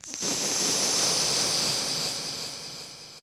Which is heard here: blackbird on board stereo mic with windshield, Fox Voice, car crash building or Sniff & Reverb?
Sniff & Reverb